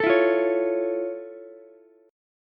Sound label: F Augmented Major 7th F Ukulele; F+M7; strummed